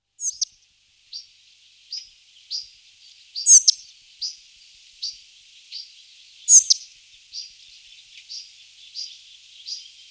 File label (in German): grauschnaepper, gesang; singvoegel; roche 2004